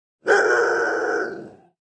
Huge Dog Rottweiler, Huge dog barks menacingly Rottweiler; Dog Sound Effects; Animals